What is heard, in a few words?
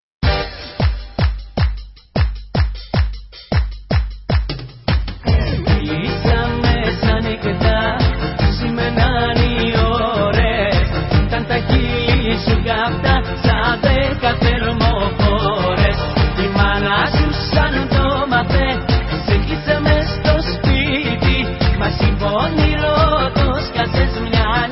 Syrtos Greek